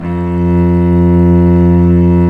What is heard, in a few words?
cello, Arco Solo; String Master Series